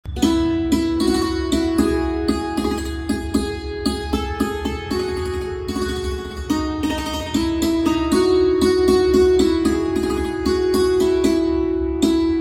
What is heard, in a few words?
santoor instrumental ringtone